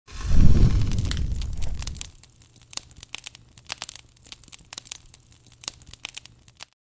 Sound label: Light the fireplace